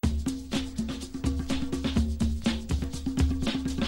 break loop

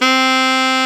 sax alto ff C, Short

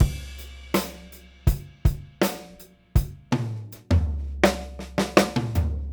Beat Loops, 081; Zero-G; Monster Beats

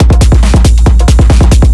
drum, 138, drum-loops, Techno; Techno ID; fatboom

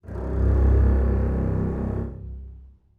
bass leg C1, Strings; BBC

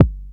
Kick, dry kicks; Quasimidi Rave-o-lution 309

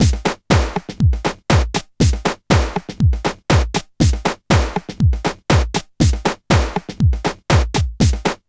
TECHNO; INIS; Funky Xtreams

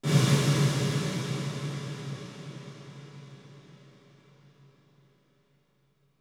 HALL; Back In Time Records; Big Bang; THE HIP

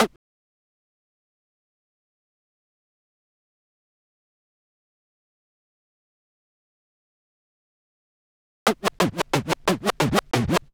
Finale Scratch, Scratching; Zero-G Smoke

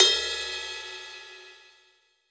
Pop Ride Bell, Cymbals; Roland BK-5

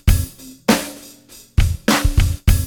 BEAT, Beats 100 bpm, Hip Hop; E-MU Formula 4000 Series Vol. 1; Hip Hop Nation

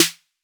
808 snare; SNARES; Wizoo - Platinum 24 Electronic

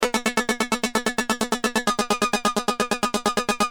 Keys, Industrial

